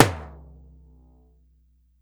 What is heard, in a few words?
TAMBORIM, Brazil Drum Perc